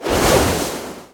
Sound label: Shoesfast Activation